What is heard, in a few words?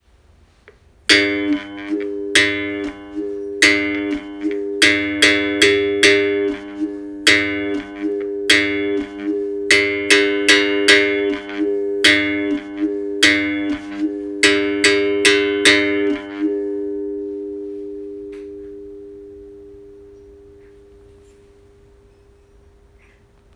weak berimbau wah-wah; Capoeira Music Practice Material